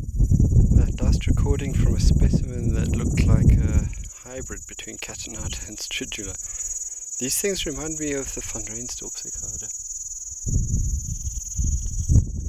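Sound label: Platypleura, Die Koo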